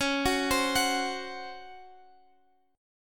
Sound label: C sharp Major 7th Suspended 4th C sharp